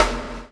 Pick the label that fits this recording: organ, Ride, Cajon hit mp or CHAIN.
CHAIN